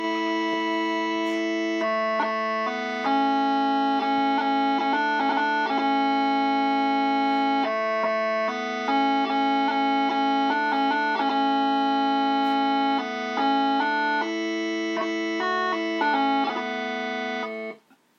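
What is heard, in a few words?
bagpipe tunes; the holidays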